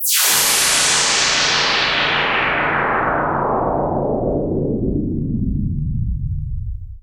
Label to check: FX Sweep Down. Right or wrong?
Right